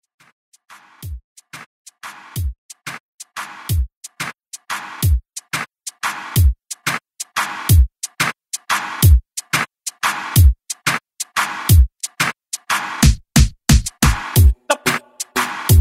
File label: Urban Reton 90 Bpm